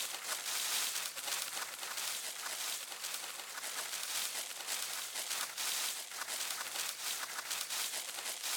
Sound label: Footstep Foliage Palm Loop; viewfinder